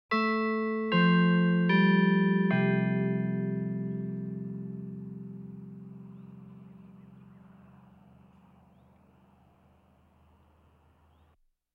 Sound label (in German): kirchturmuhr; Auf dem Land